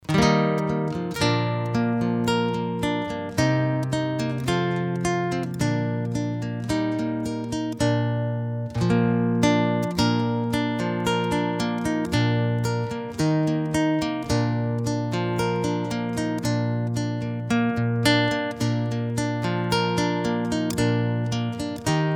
background kumbaya

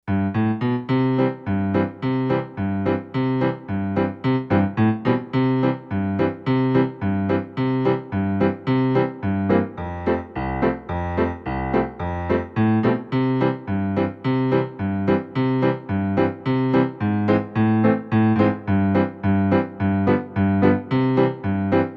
background do lord

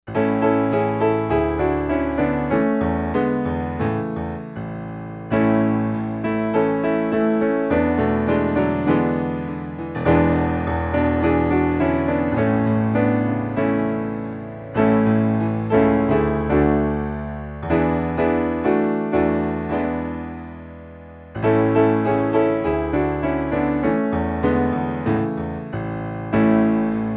background count your blessings

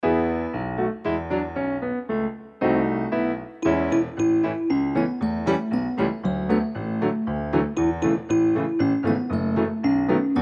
background give me oil, background music

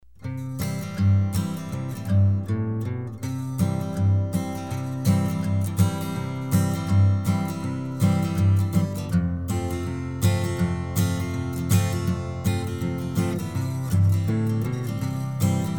background this little light, background music

